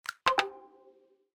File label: chat message